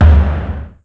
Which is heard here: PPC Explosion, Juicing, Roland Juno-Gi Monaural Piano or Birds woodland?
PPC Explosion